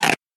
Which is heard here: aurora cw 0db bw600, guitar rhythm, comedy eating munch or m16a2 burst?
comedy eating munch